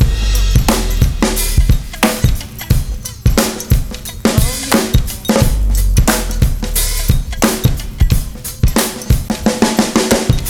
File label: Go Go Swing 89 bpm, breaks; Zero-G Beneath the Planet of the Breaks